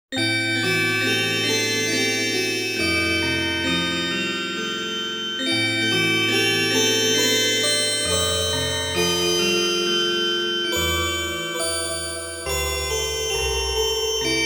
Haunted Mansion Organ; Shin Megami Tensei Devil Summoner Soul Hackers; 3DS; Haunted Mansion Organ -Alt 1

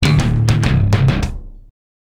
fanfare

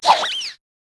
Snail Throw

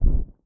Kiwi Step Bass; lethal company

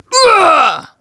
enemy die

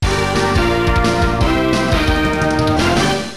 Lose; Heavy Smash: The Future Sports; Data East

